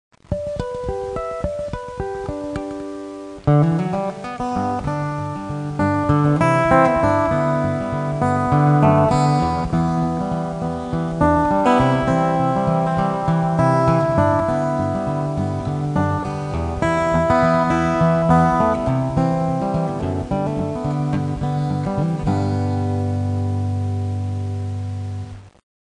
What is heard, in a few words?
great divide soft, guitars